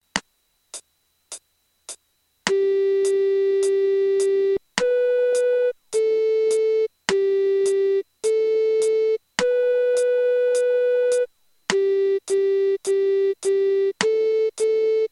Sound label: Melody Only